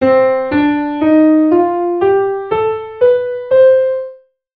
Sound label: Diatonic Melodic Minor Scale